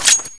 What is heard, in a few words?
knife draw; nataknife